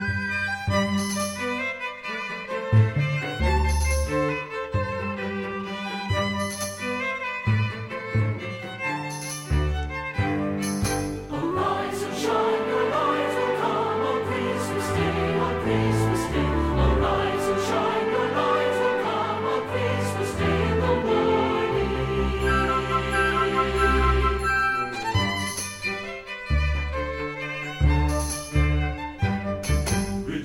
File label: Carols